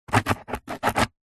office pen biro ball point sign signature on cardboard piece